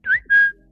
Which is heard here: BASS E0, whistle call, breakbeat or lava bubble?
whistle call